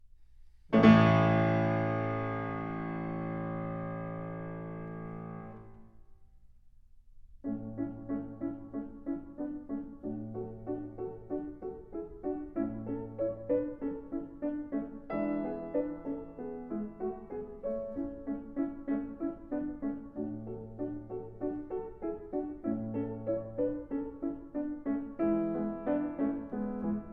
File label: Sonata V Adagio; Sonata V Adagio Sitio